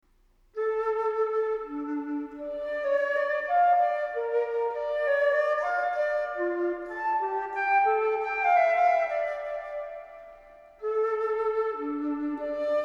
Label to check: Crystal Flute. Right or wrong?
Right